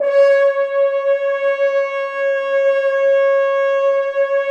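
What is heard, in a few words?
French Horns f, Dry; Roland LCDP06 Brass Sections; F.Horns 2 f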